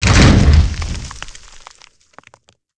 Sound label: rock hit; pcdemo